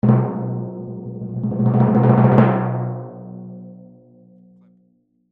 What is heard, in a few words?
Tympani Drum Hit; Hit Sound Effects; Musical; SoundEffects-BlastWave